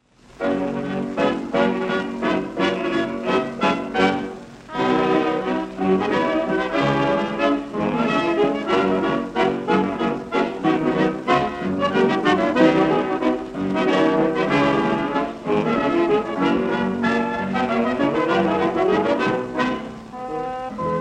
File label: Orchestra Vals mazurka